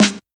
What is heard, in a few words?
clean snare one shot g sharp key